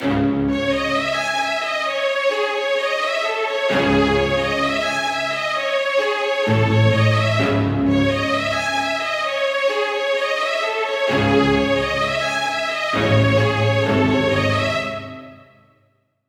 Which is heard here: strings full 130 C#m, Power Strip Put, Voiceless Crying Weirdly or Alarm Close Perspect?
strings full 130 C#m